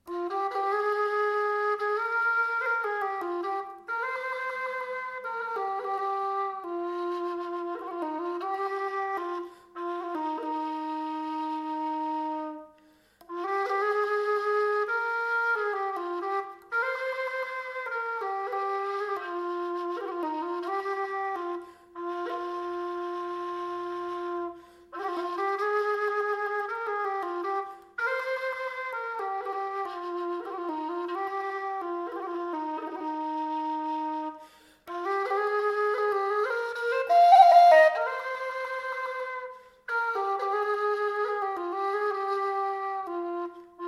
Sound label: Low Eb Aire